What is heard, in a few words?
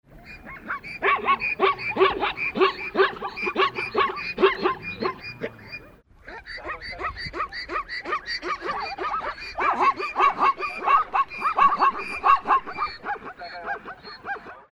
zebra bray; zvuky zvirat